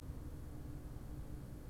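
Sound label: blower left, organs; E-MU Producer Series Vol. 5 – 3-D Audio Collection; 3D Pipes Dr Joyce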